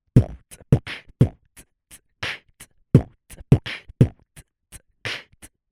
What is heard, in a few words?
beatbox loop; good recording